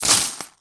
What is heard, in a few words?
coins bag